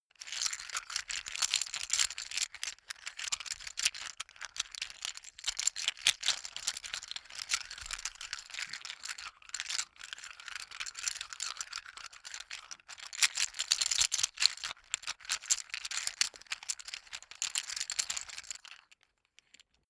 poker chips handle sequence hard

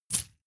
step armor chainmail

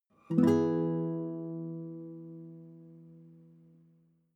nylon guitar chord high major soft

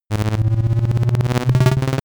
arcade racer

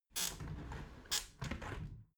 window open soft sequence

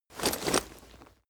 leather metal handling short

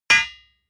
hammer hit steel

